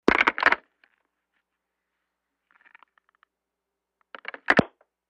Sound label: Telephone in-line pick - up, handling noise, hang - up; Futz Movement